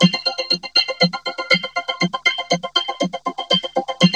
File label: VOC LOOP, VOCODER LOOP; Zero-G Ambient 1